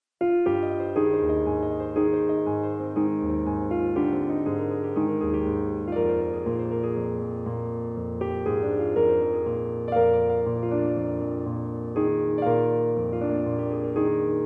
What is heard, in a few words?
Piano